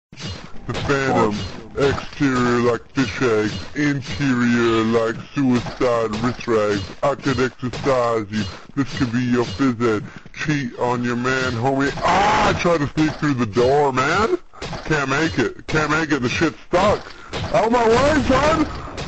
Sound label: door stuck